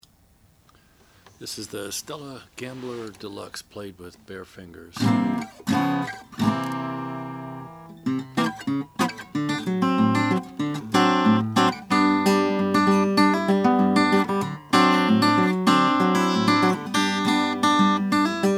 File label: oscar schmidt gambler deluxe black; 1932